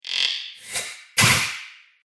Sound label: Robot Factory Noises; Robot Sound Effects